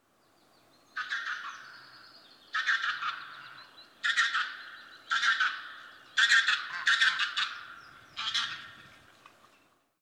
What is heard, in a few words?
Taiga Bean Goose Anser fabalis